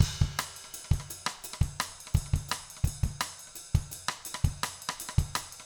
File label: jungle 170